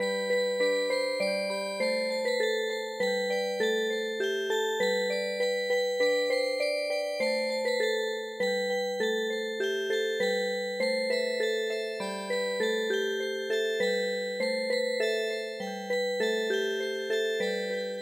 Music Box, traditional; SHIRAZ girl

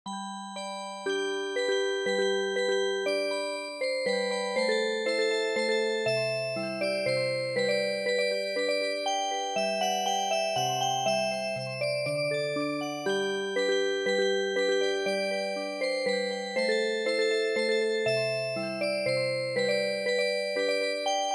Music Box, traditional; East India Nightingale

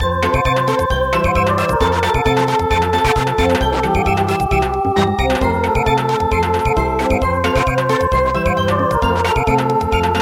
Polka Samba; Helena Polka

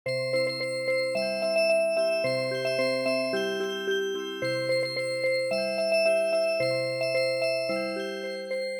seiro gan Music Box

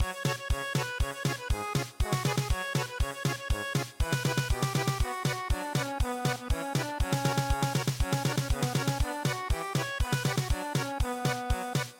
German Polka

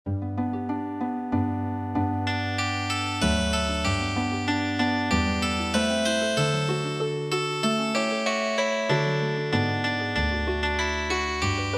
Healing Harp

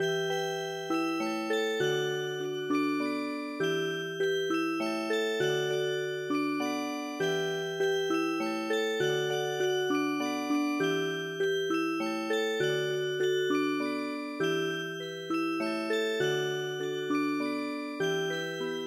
Music Box

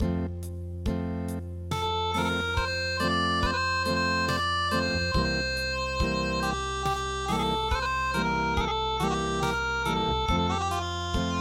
Acoustic

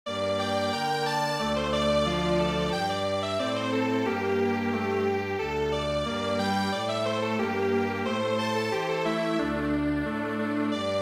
Strings Quartet; Embroidering a Pouch bag

